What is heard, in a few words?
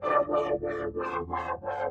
SYNTH PAD ETHNO 125 A, Sphere